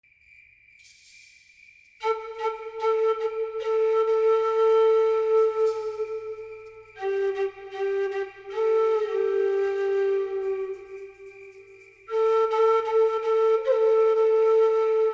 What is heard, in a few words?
Cricket & Flute Conversation